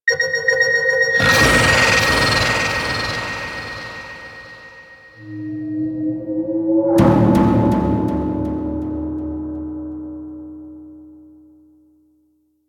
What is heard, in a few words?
Harvester Warning; Corpus